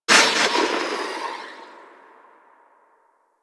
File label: Dex Furis Tail C; Tenno